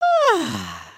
big love, emotes

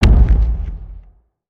Troll Drum Long

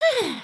headspin, emotes

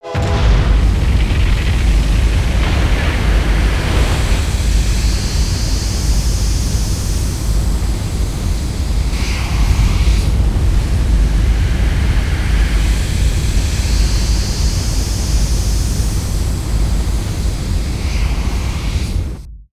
Full Steam Ahead

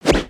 Char Delete Whip

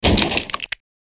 Crates n Boxes, destructibles

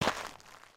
Foot Dirt Sneaker Walk, footsteps

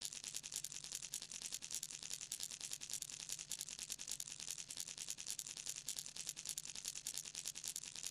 Quick Builds Snapping

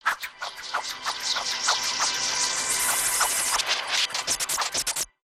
Legos Swirling

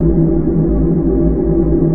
Infected tree, ambience; avant gardens

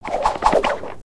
Pet Cat Approval; creatures pets

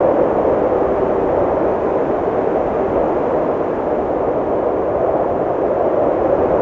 wind

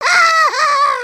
freak out, emotes

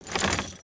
Shoot Complete; ambience; gnarled forest

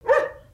Pet Terrier Bark; creatures pets

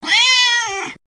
Pet Cat Speak; creatures pets

